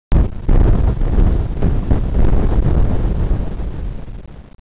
Thunder; Quake; PainKeep

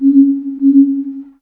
nav buoy; Earth and Beyond; EA Games

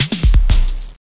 Clik Beat; Total Distortion Big Demo

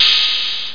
HIHAT

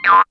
BOINK

